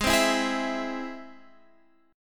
G sharp Augmented G sharp, down, Guitar, Standard; strummed; JGuitar

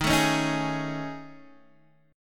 A Major 7th Flat 5th

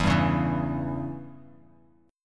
E Minor 6th chord; Em6 strummed; JGuitar

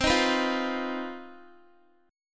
C Minor Major 7th Double Flat 5th chord, Guitar; strummed; JGuitar

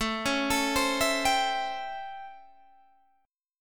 A 7th Sharp 9th, Guitar, Open G

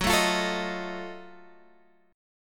F sharp Minor Major 13th, Guitar, Open G

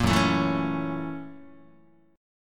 F sharp 7th Sharp 9th F sharp down Guitar; strummed; JGuitar

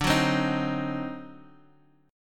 D 7th Flat 9th; 10,9,10,8,x,x; strummed; JGuitar

